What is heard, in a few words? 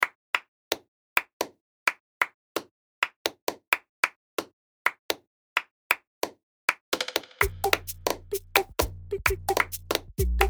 Baile Funk Loops; Afroplug